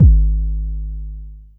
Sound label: 808 G#1, Kicks; Drums; MBase 11 - 808